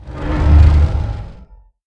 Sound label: Effect Combustion Start; Lucius III; pc linux